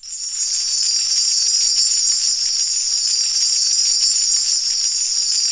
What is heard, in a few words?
BELLTREE